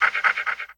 dog pant

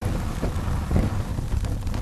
fire

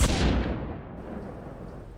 gavel sound; birdman

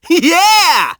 "ye-hea!"; sonic; vc roger

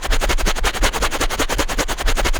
scratch

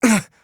grunt

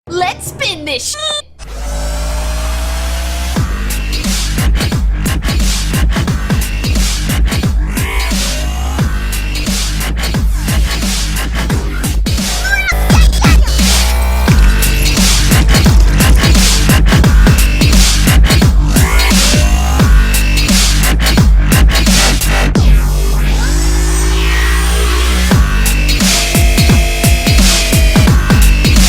wubs

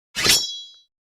blade

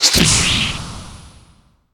swipe

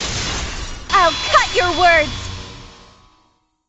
cross swords; kaede akamatsu

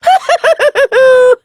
puhuhuhuhuhu; monokuma

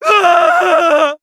scream

